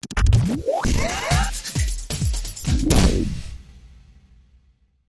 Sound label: Trippy Groove